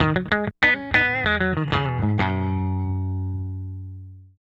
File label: Guitar Ending, 70s Analog Funk; Sonic Foundry (Sony Creative Software); What it Is! 70s Analog Funk